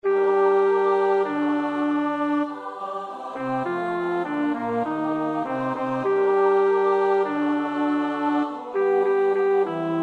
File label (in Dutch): bas, koorpartij oefening; Schütz (Heinrich); Matthäus Passion SWV 479